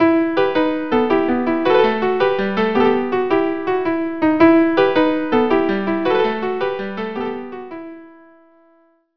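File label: violin violin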